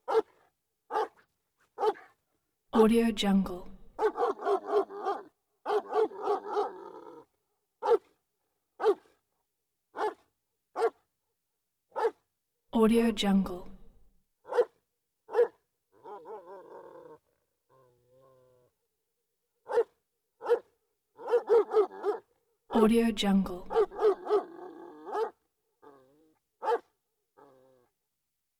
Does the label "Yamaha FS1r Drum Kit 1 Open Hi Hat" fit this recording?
No